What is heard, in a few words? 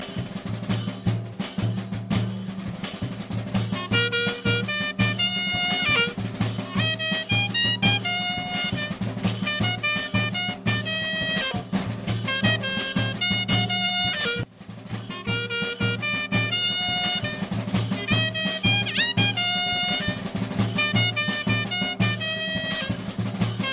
Tamborito; Pandora